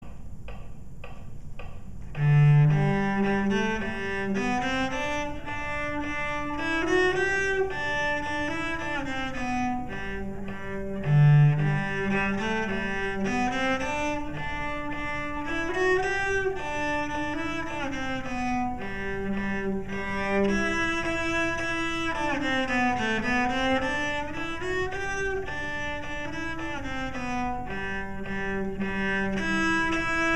english folk song cello fast

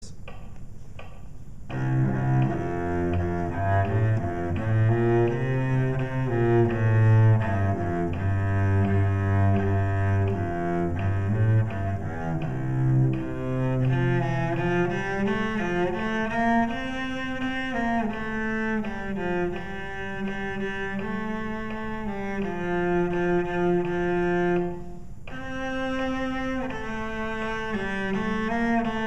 fast cello; simple gifts

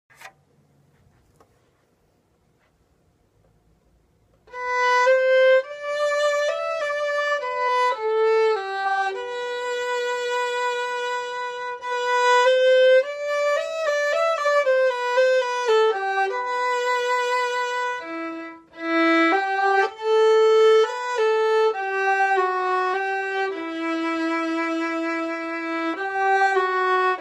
violin scherezade

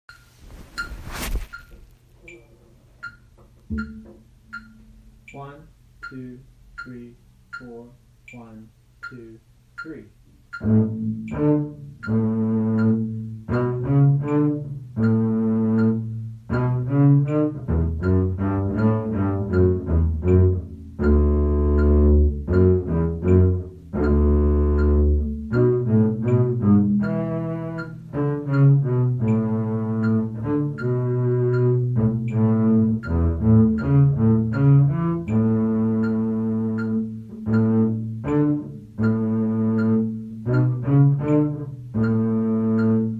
march slow 80 1st repeat bass

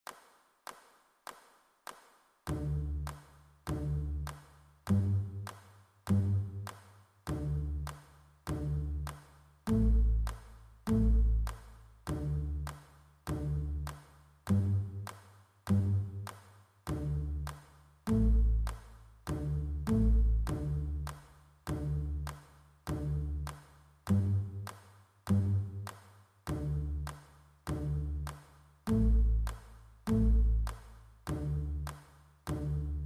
cabbage pizz. with clap bass cello

